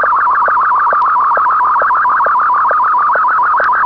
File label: sstv